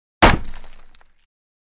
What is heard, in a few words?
Ax Impact Wood, axe hits